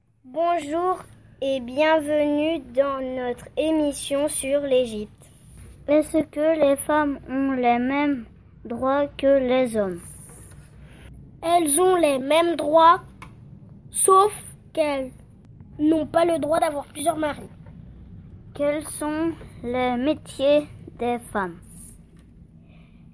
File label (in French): vocal